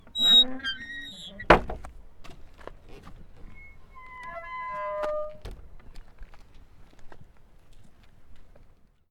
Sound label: Footpath Gate